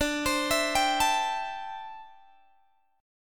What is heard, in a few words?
D 9th Suspended 4th D down Guitar Open G